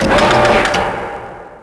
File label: Bank vault door lock opens; Max Payne; subway